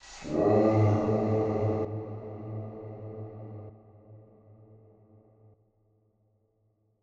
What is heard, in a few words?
130bpm Am, Vocalpack; Vocals